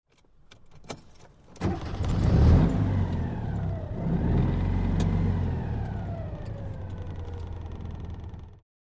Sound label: Citroen Deux Chevaux, Interior Pov, Start with Rev, Idle.